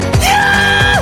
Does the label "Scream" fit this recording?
Yes